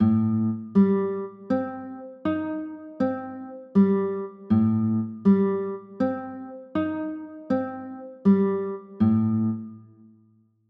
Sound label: a m7b5, chords